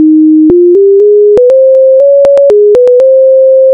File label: Fight Song